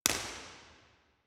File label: Parmer Live XY STEREO; room survey; Parmer Hall; Messiah College